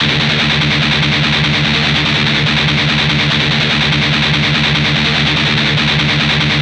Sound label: guitar; LiLA'c Records PsyTrance Sounds 01